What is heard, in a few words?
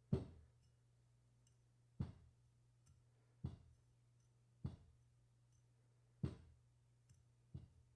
glass on tablecloth